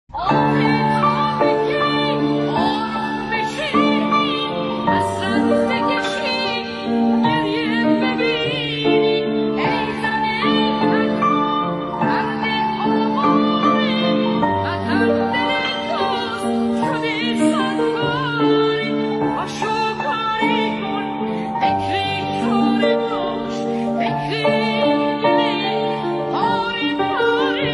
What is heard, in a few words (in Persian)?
music, آهنگ کوتاه غمگین ایرانی